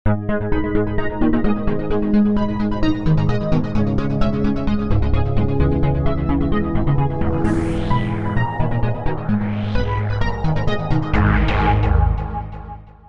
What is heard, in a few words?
lost round, music